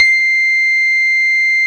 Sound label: Cheng C5, Fairlight CMI IIe